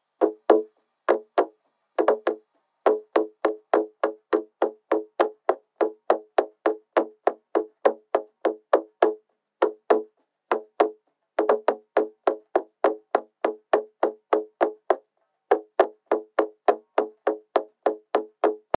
transient perc IN

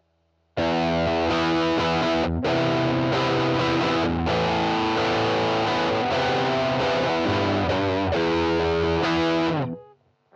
Dapper Indie Drive riff; VALETON

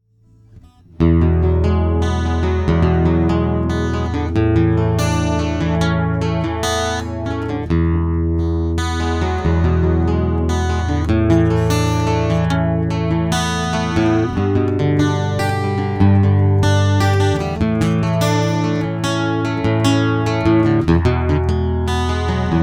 Condenser 78 Mirage Chorus Dreadnought

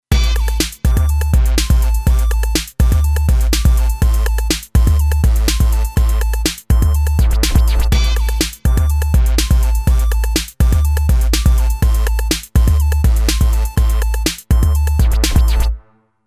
electro

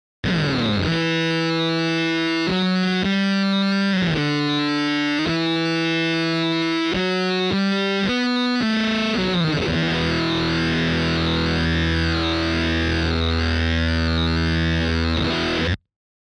DFST 1 High Overtone High Speed

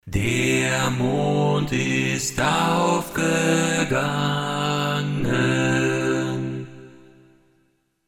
midi lead; tc helicon perform ve

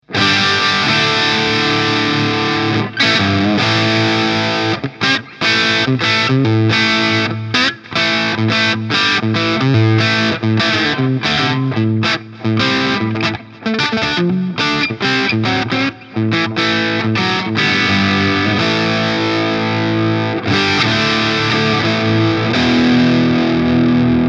Custom 24 Steg zerre; PRS SE; Stealth Quilt LTD; peavey

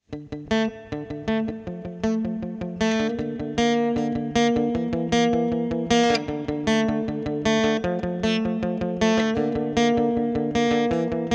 Retro20 Reverb

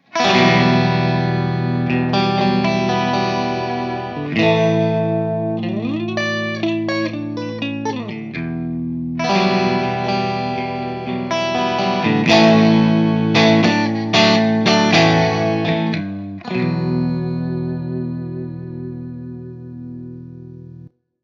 Artisan CH2 Hi Input Bright Gain half

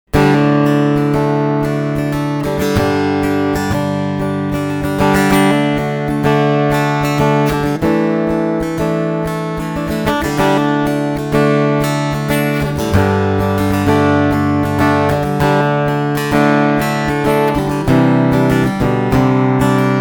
TL 6 linear Strumming; ESP LTD

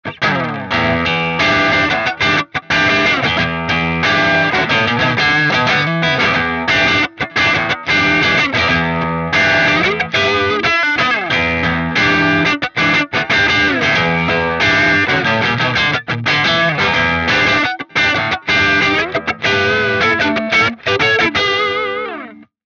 Mid Gain; Archetype Petrucci

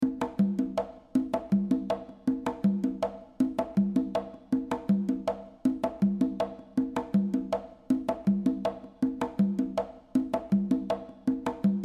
reverb loop Vocal Hall; ChromaVerb

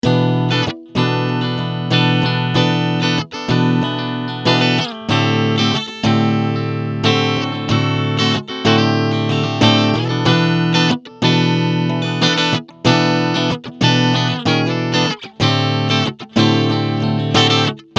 clean strumming beide picku

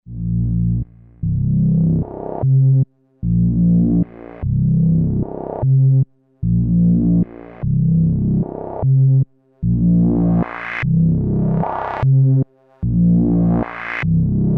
ms20m ws bass pad

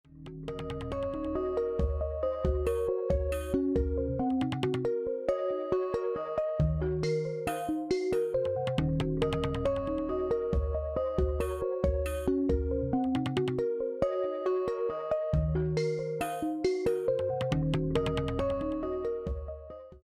weird world

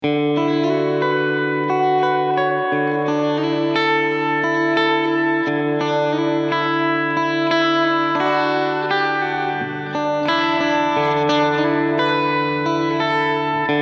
Spring tone open

